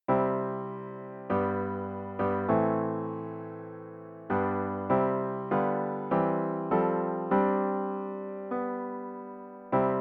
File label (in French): hymne national canada; lavalla calixa; canadien english